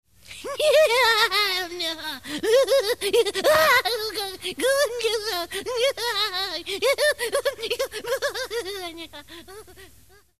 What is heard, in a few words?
Crazy Giggles; Halloween; Hallmark Halloween 1989